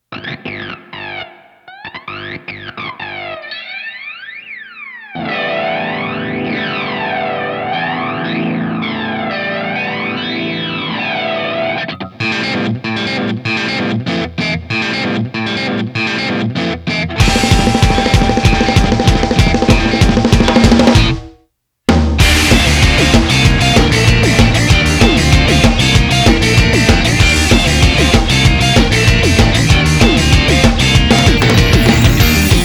Instrumental

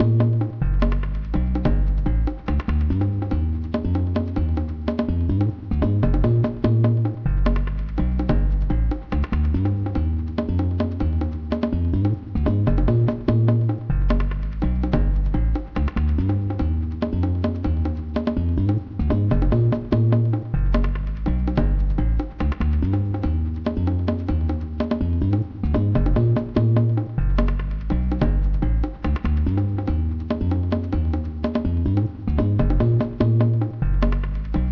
bgm bass; Project Yume 2kki; Yume Nikki

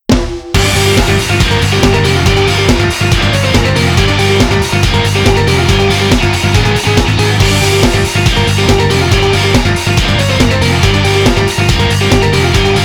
Instrumental

